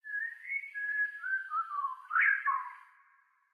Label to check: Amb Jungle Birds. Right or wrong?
Right